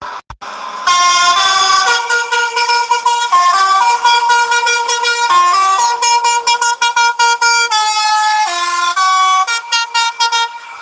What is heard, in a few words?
horn